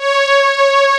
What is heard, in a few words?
ANALOG PAD; East West; Global Sounds